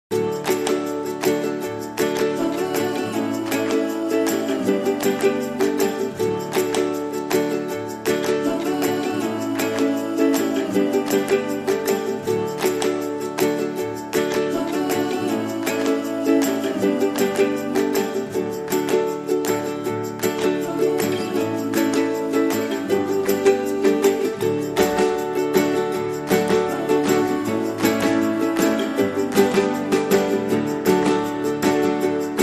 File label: Fireside Jig